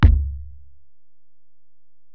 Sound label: Ac BD, Alesis DM-PRO; 300 Drum Machines